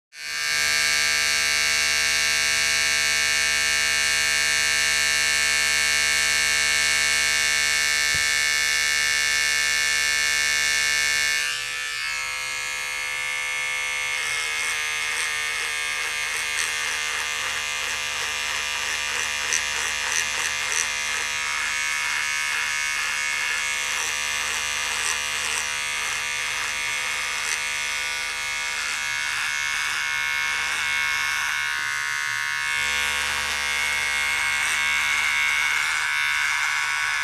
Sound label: Electric Razor Shaving Elektrischer Rasierer